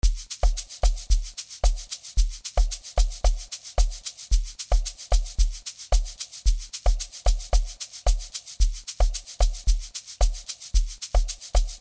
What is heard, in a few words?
African Bells